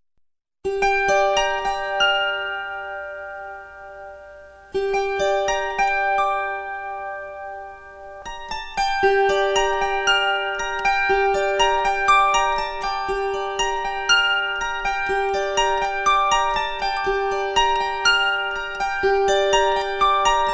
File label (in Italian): arpa celtica